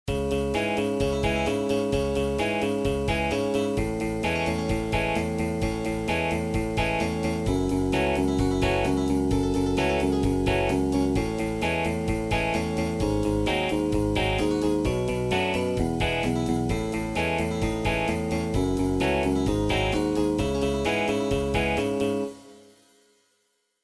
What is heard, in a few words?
instr; ai教サウンドロゴ001